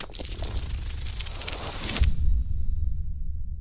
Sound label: dice roll sound